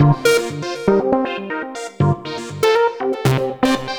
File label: acid sequence; Sonic Foundry (Sony Creative Software); Ugly Remnants VOL-2